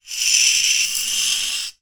foley thin metal small wire art drag scrape surface; zapsplat